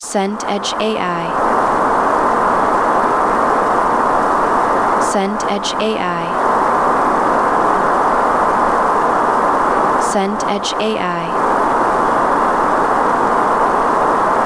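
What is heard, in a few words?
ambience desert wind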